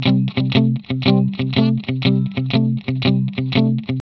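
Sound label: Vintage Blues Guitar, rhythm 120; AKAI; USB Soundscan vol.22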